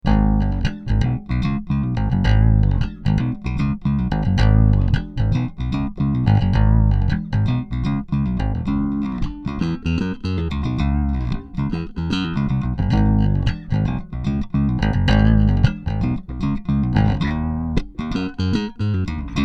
riff di

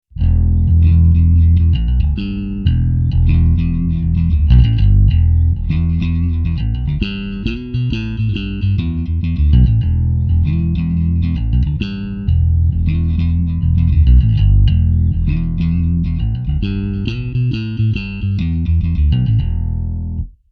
riff svt cab

